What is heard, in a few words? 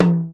clear tom sample f key, Royality free tom drum tuned to the F note. Loudest frequency: 378Hz; tom drum samples; one shots